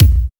Hard Kicks; Vengeance Samples

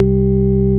Basses Root C; Vengeance Samples

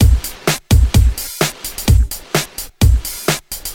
House Beat, 128 BPM

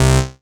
Synth Bass; Vengeance Samples; VEE Synth Shots

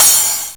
Cymbals crash; Vengeance Samples

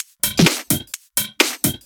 Electro Loop 128 BPM; Vengeance Samples

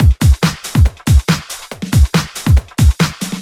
Loops BB 140, BreakBeats; Vengeance Samples

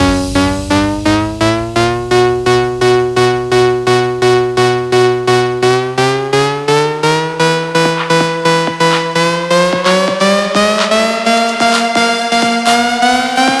128BPM Mix; Vengeance Samples; VFH1 128BPM Northwood Kit

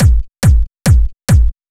Kick, 140 BPM; Vengeance Samples; Song Kits

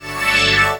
Trance Sweeps; Vengeance Samples; VEC2 FX

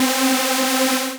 Synths C; Vengeance Samples

